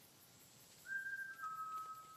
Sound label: Whistles